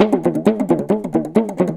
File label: PAPAN, Indian Traditions; USB Soundscan vol.45; AKAI